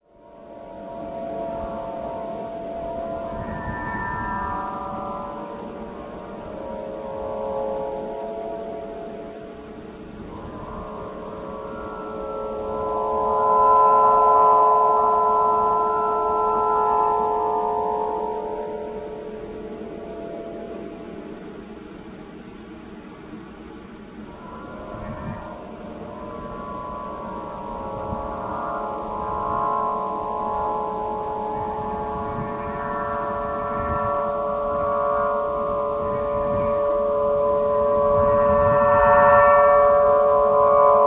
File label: Celtic Wind